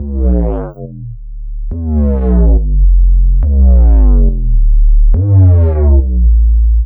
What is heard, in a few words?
140 D BASSLINE, Dubstep